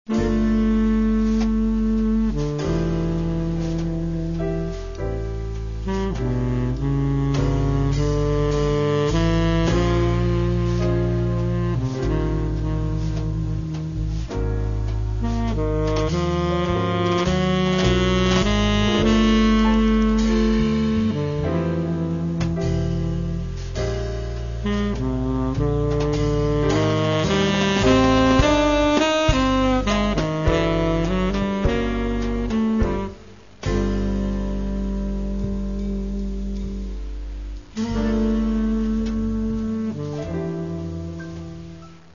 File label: jazz; ukr jazz